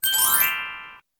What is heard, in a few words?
click Effect; Garfield's Diner; Android game music